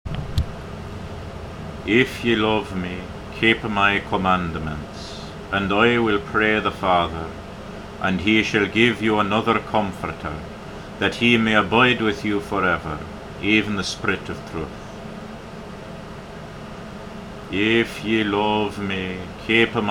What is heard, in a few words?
if ye love me, 16th century english legato